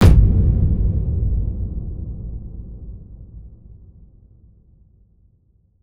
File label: Clap